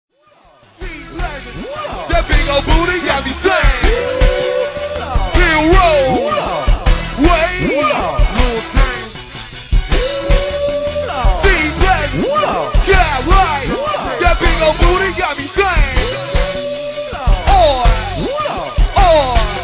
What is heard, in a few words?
whooh lawwd, ringtones